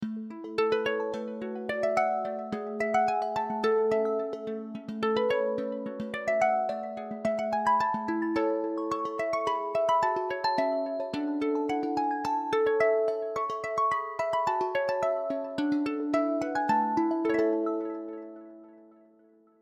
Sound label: escort bgm; jeju